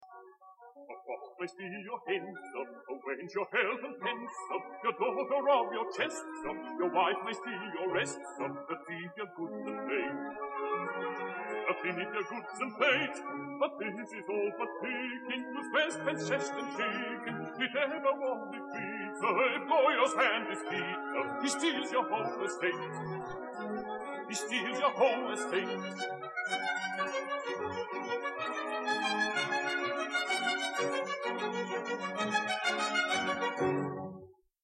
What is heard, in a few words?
air